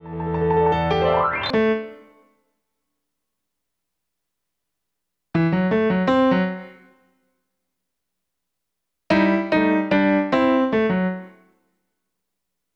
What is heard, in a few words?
Piano